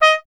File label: BRS TP D#0TR, Trumpet; Solo Instruments; Miroslav Vitous